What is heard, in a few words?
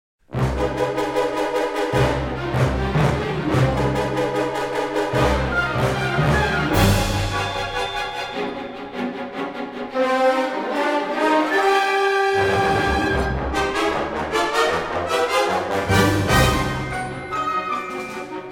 Action Orchestra